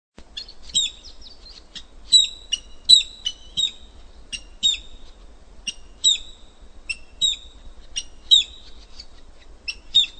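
Carpintero Pitío, Chilean Flicker, Colaptes pitius; cantos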